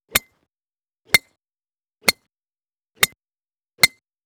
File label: golf club driver; mono; soundscrate